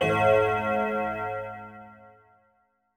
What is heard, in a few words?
Fail